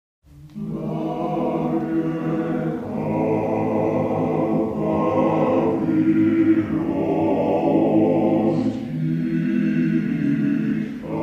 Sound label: RUSSIAN HYMN